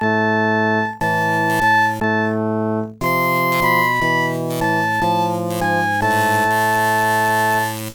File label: you died; The Music Studio